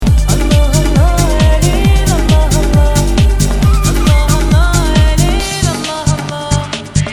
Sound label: arabian